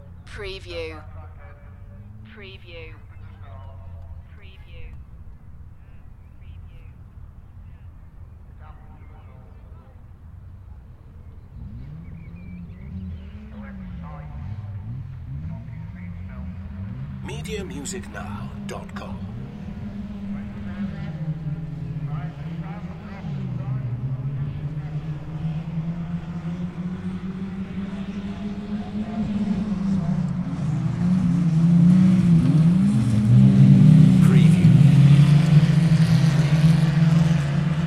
Dirt track car racing UK ambience from a spectators position; beanstalk audio